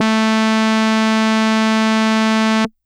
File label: Hard Brass DX100 A3